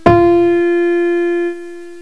PIANO NOTE

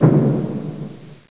tank gun

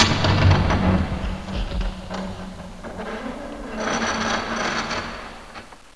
BIG DOOR

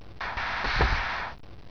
WHOOSH

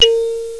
TING

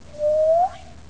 WEIRD

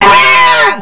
SCREAM; JOSEPHIN

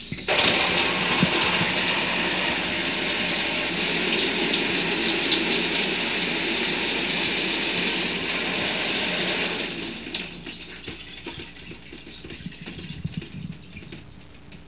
SHOWER

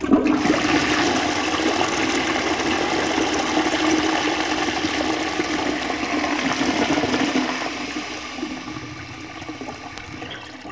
FLUSH

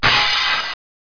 GLASS BRK; JOHNNY

